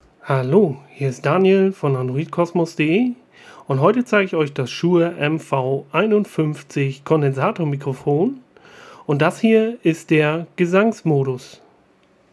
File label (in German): Shure MV51 gesangmodus